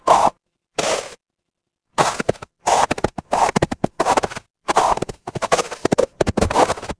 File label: footsteps snow